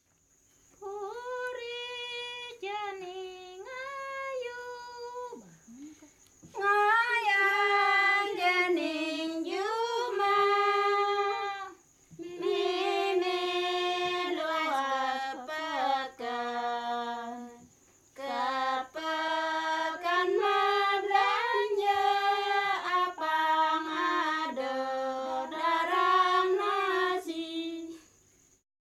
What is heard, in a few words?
gending rare; Asak